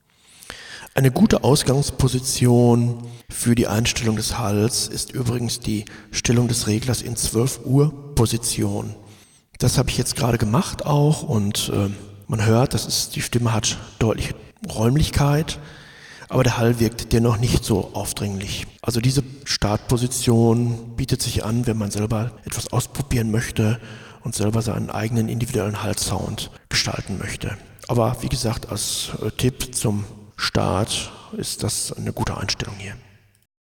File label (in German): Harmony 12 Uhr Position